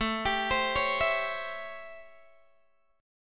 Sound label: A 7th Sharp 9th A down Guitar Open G